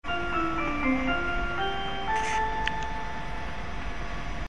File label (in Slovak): Stanicna znelka; Trencianska Tepla Nedaleko od Trencina